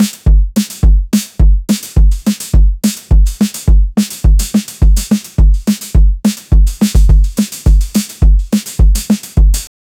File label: drum